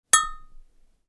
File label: UI Quirky